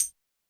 Tamb A, Yamaha DTXpress-II, Perc

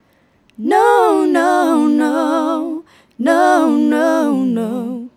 Female Vocal

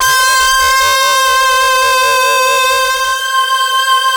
LEADS; Masterbits; Vintage Voltage

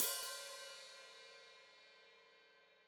Hat Foot Open, Hi-Hat; Cymbals Stereo; Deeply Sampled Drums

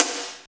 SNR, Tekno Industrial; East West

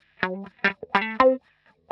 125 wah E4; PowerFX - Nu Jazz House

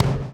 DOWN, DOWN KIT 78, Groove Activator; AMG Gota Yashiki Groove Activator

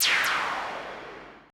AMBI FX

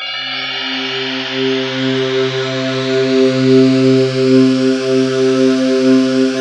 PPG forever, Korg O1/W; Rubber Chicken Software Sample Bank

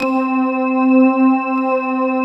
MARIM PAD, JV PADS; Pro-Rec - Synthsonic

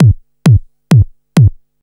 KIT, DRUMS & PERC

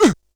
Ooh!, Sony DRP-2

